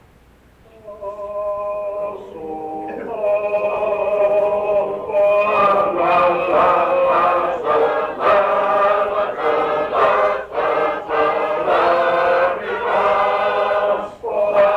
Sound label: Reese